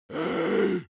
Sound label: monster growl attack deep; kreaturen